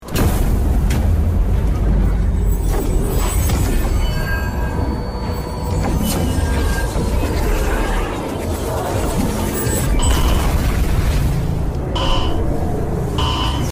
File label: chain; hollow ichigo scream